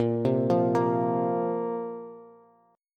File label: A sharp Suspended 2nd A sharp down Bass